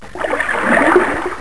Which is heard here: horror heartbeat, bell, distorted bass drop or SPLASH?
SPLASH